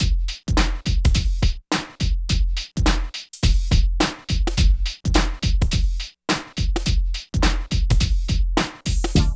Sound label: DRUM LP; East West - Phat & Phunky CD2; 90's Sample CDs